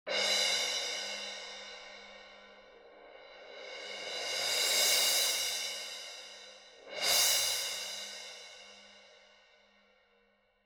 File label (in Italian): percussioni piatti